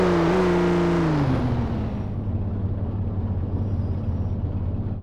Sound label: slowdown slow, vehicles cars; lwcars; spy c8